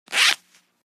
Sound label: Zipper Short Light